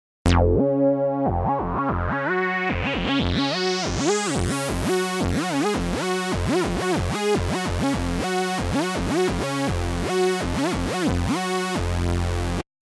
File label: VA dirty